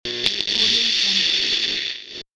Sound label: short radio noise